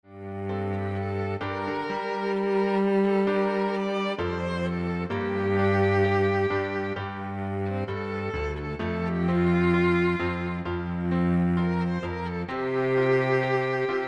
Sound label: Piano Trio